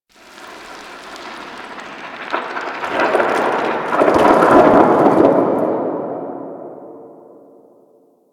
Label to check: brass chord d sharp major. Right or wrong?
Wrong